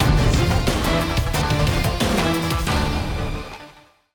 sting defeat; super awesome rpg; android

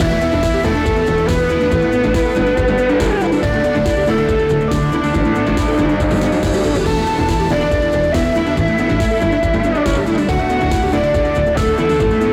Menu Loop; Race Arcade; macOS; PS4; Windows; Xbox One

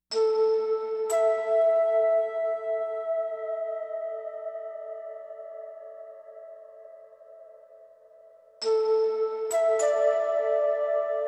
ambient; Myst Saga Ambient Tour; Above Stoneship

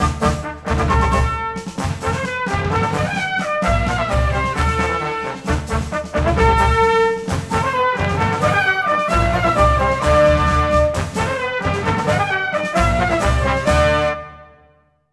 Stereo Main Menu (Stinger); SpongeBob SquarePants Lights Camera Pants windows

